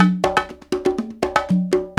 World Dance

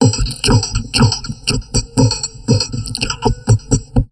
TALK 120, Drum Loops Crazy Processed; USB Soundscan vol.07; AKAI

